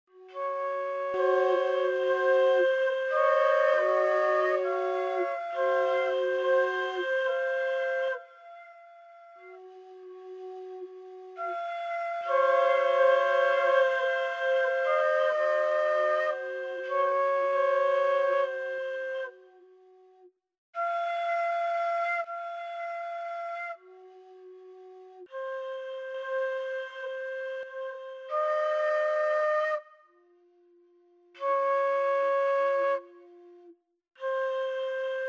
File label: disco